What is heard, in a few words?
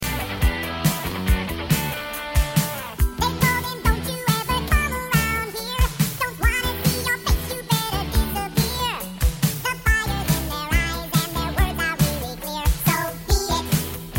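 Chipmunk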